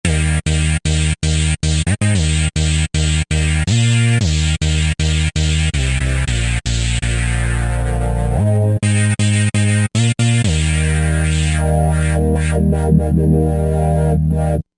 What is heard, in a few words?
Chord, synth; 2ndRateChord